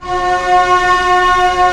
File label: STR C2 PP; Sound On Sound; Peter Siedleczek's Orchestra